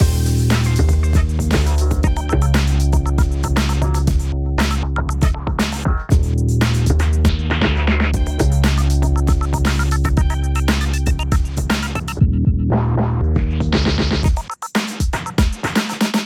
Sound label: 90 hiphop 118bpm; hot lava; ios macos windows